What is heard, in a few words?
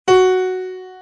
3 F#, piano